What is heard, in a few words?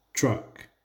truck; how many toys